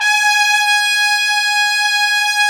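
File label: trumpets section; Roland L-CDX-03 Disk 2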